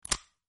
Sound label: iphone unlock